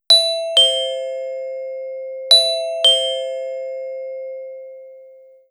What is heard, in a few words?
Glockenspiel Glockenspiel, Deurbellen; 500 serie